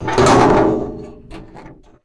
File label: heavy dumpster lid slamming shut; metal